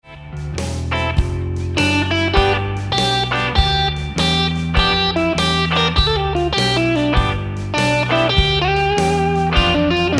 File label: Reconsider Lick, guitar